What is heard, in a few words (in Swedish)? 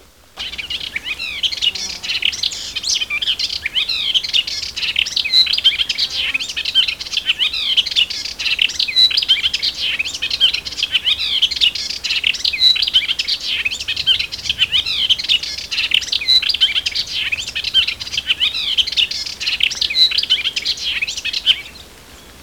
Rodstrupig sangare